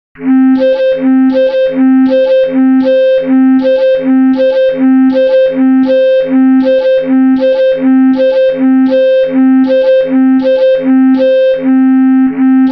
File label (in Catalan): Ball Ingles vell (bombo sol); festa